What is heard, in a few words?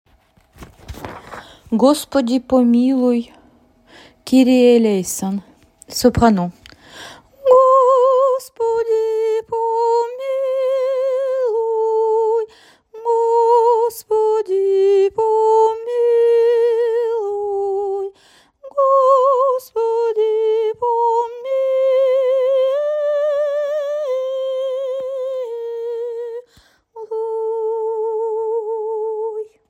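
gospodi pomiloui soprano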